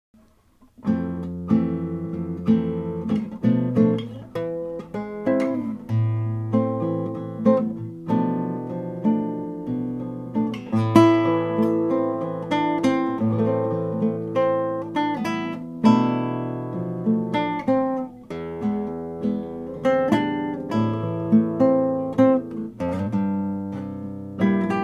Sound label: gentle rain, guitar solo; spanish